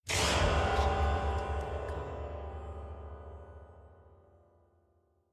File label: piano swipe